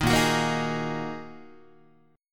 Bb 7th Suspended 4th Sharp 5th Bb Guitar 4A,4E,5A,5D,5G sharp,6C sharp; Bb7sus4#5; strummed; 6,6,9,8,7,7; JGuitar